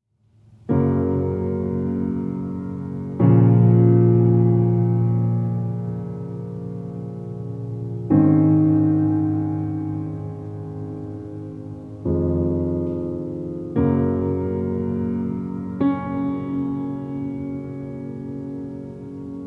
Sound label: Piano Reworks